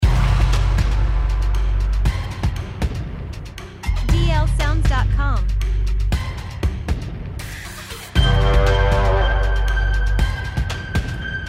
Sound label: Dark Sci-fi loop